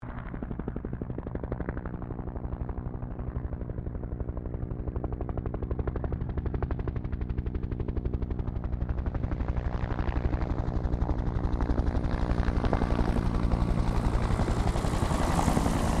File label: HELICOPTER 1967 BELL UH1 IROQUIOS PASS DISTANT; RAN UH-1 Iroguios; Helicopters (Sound Effects:Vehicles)